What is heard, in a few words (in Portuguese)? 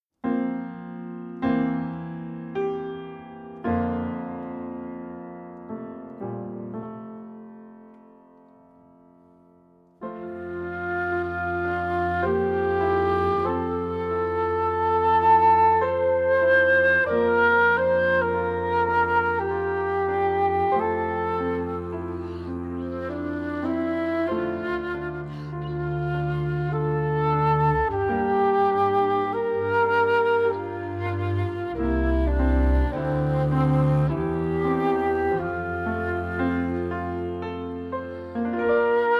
cinco miniaturas brasileiras cantiga de ninar